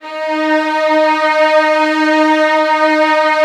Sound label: D#1, Strings; ILIO; Kirk Hunter Virtuoso Strings VOL-3; STR TAME